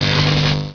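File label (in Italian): Elettrico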